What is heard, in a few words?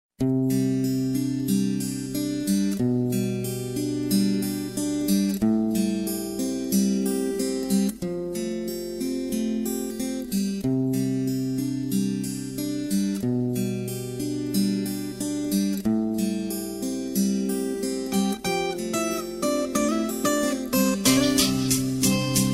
Tatar Mongolian